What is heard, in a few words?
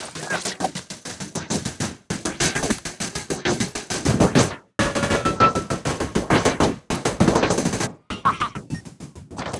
Envelope Beat; Drums & Drones